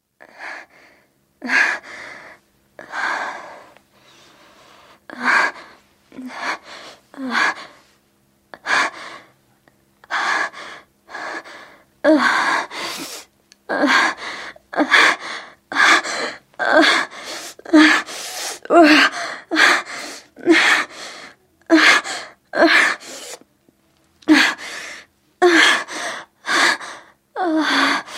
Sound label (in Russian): стоны и вздохи девушки во время оргазма